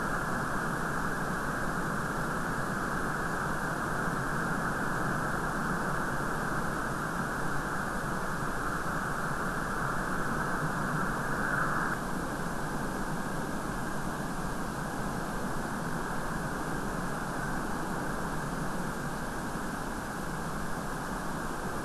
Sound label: WFR audio emfisis